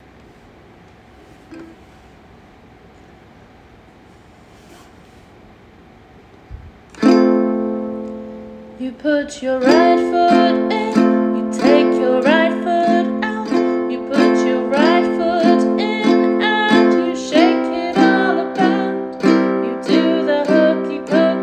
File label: Uke; Hokey Pokey; Uke book